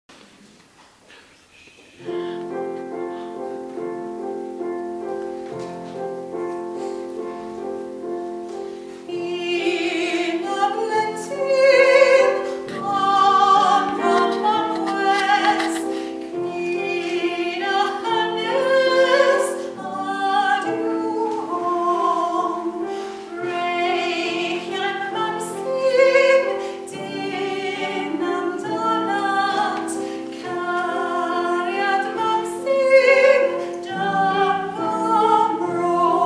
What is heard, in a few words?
suo gan my solo first verse